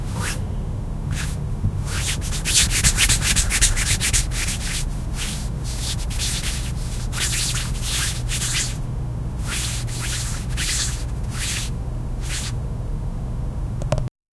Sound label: nails slow scratch